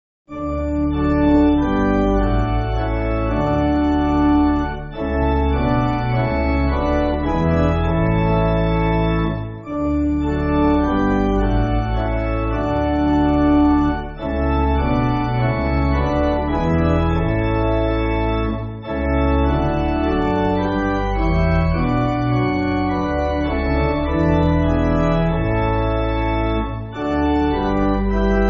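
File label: Pipe Organ, Backing Files for Churches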